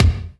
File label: BD, DR BD; Best Service Gigapack 2